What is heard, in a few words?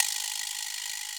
vibraslap